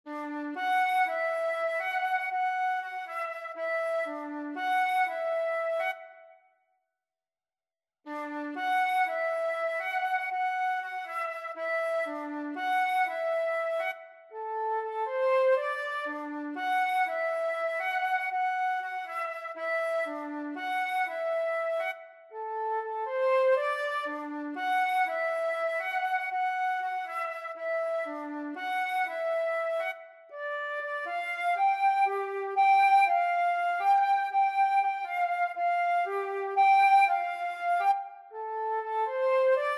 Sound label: samba, flute